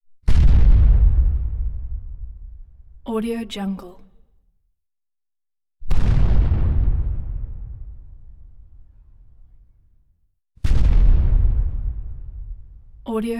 sonic boom

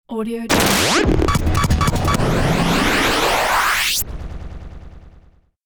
teleportation

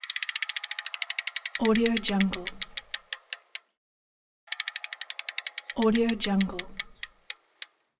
bicycle wheel

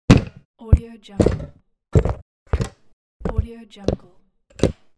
put down

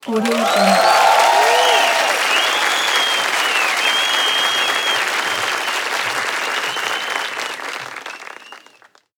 the applause

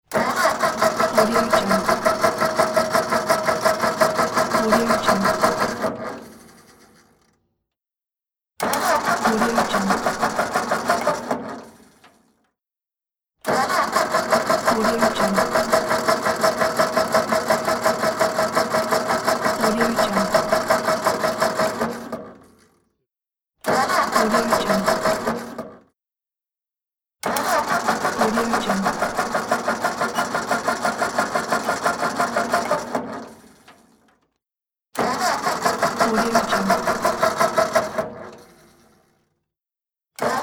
engine cranking

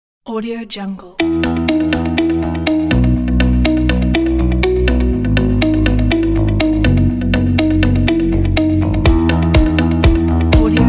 inspiring

